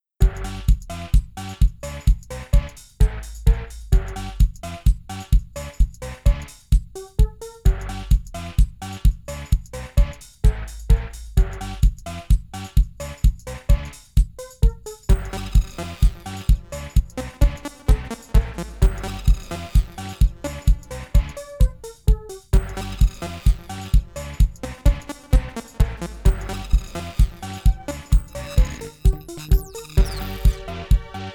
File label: fitness music 432 Hz; 129 BPM